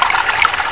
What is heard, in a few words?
water